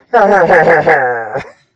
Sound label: Laugh; DoughnutQuest